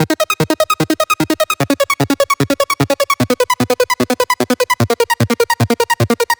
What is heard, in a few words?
8Bit 150BPM Arp Loop D#min; Antidote Advent